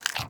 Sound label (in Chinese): 熊猫进食声